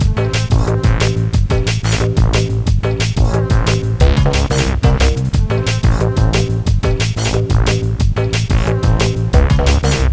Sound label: dnb; octaved